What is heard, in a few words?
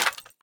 mag; aks; svencoop; ins2